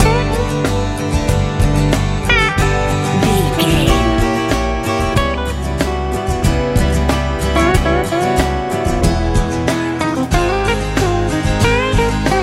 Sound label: blooming easy pop